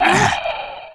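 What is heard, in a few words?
zombie attack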